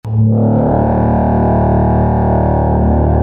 jumpscare; Garry's Mod; poppf saxosaurus

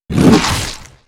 MONSTER BITE; zoochosis; whale

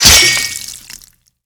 sword hit; The Monster Plane; kolmods melee

